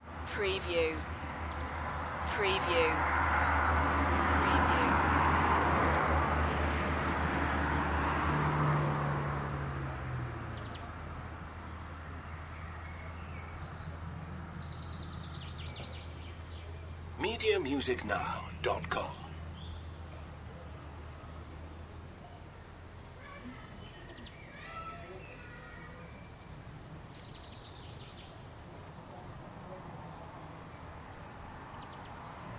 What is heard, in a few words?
rural garden ambience